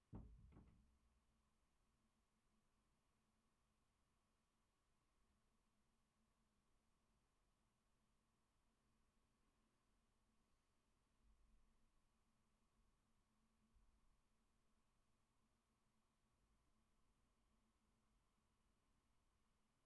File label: Roomtone Y Maze Assay